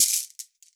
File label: shek, WORLD PERCUSSION